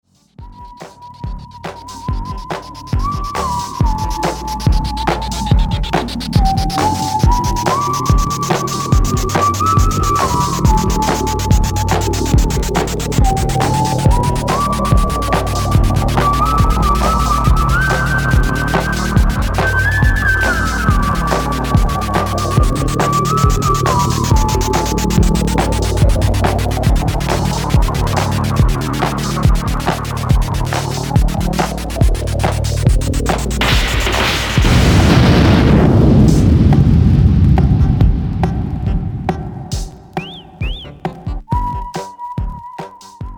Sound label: musical computer